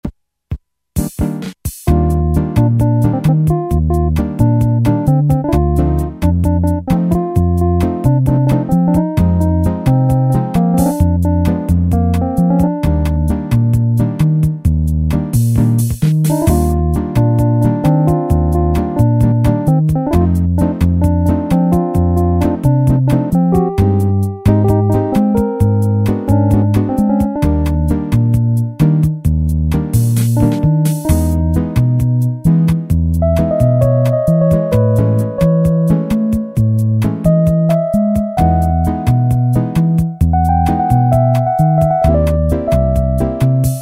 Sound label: bossa nova, Automatic Rhythms, Technics SX-K450